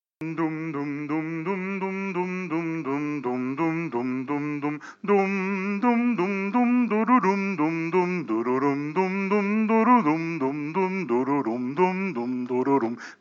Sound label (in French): Hanuka Dance homme chanté, Basse chanté